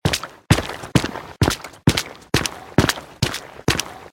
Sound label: steps; spear toss challenge